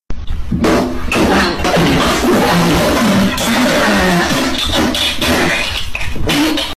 supreme fart

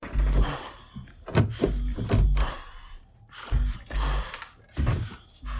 light panting

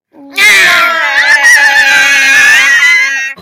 high screaming

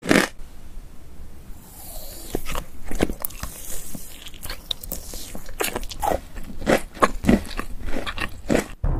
eating candy asmr; yellow among us candy

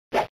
classic jump; roblox

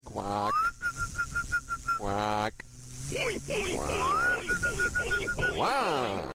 quaaaak; gabba gandalf

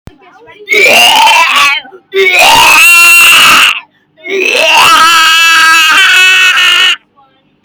not loud scream

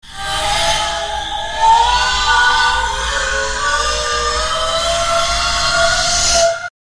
ghost womanly wail

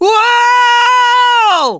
screaming; sonic

